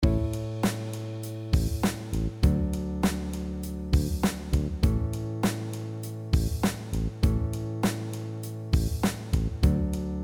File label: Blues A